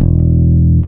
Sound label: bass 4 (E) long note; Sonic Foundry (Sony Creative Software); Ugly Remnants VOL-2; Basstuff